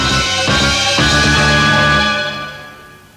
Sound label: dun dun dun